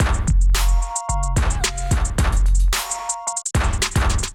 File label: kit groove 110 bpm; MIDI Clips; Bonzo Kit; Ableton Factory Packs; Beat Tools